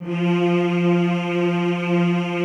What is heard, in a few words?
F2, Classical Choir; Best Service; Extended Classical Choir